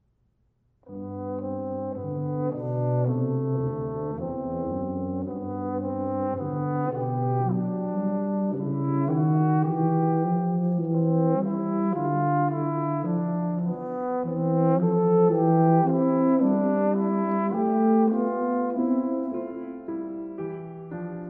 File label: Suite for Horn, Tuba and Piano - Berceuse